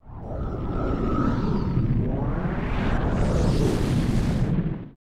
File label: LONG SWS, SWISHSWO; Total Control - Action Cutz